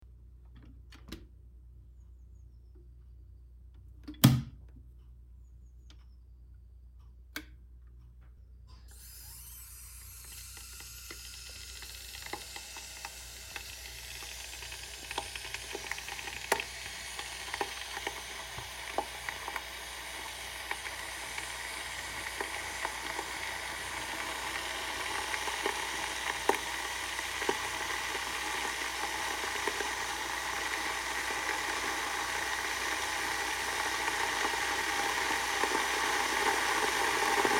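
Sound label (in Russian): electrochainik sage, electric kettle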